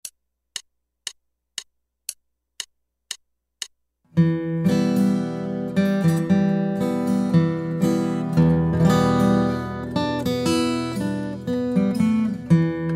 Guitar

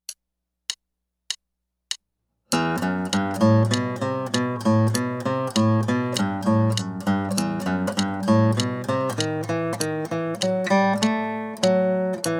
Picking Studies

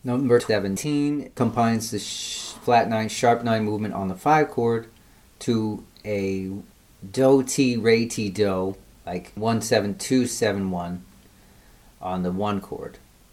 jazz licks

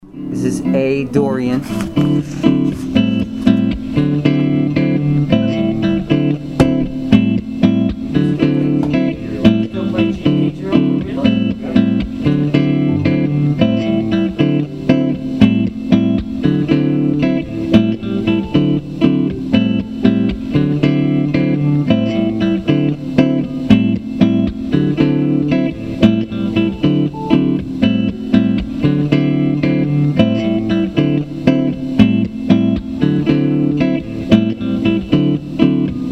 modes in key G A dorian

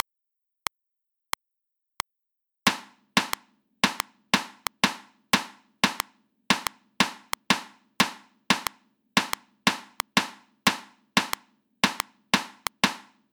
rhythms 16ths at 90 bpm

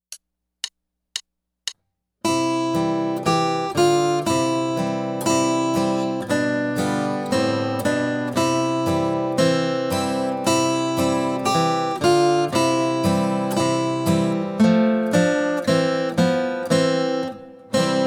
Our First Duet

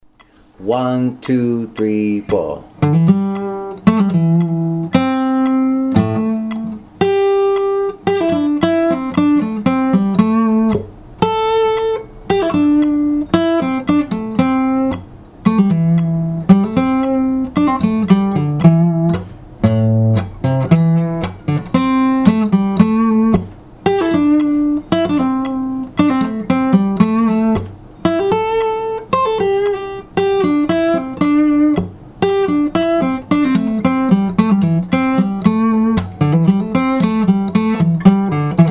hammer ons and pull offs

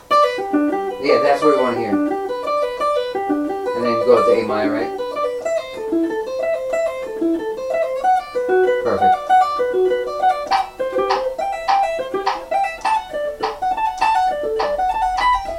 3 string sweep arpeggios, guitar; sweep picking

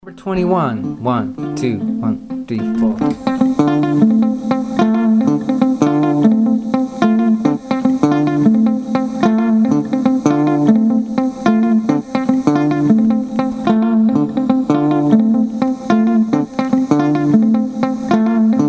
mozambique patterns

